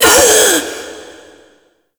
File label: Minigame Ring Fail